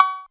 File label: 808 Cowbell, Novation Drumstation; Novation DrumstationV1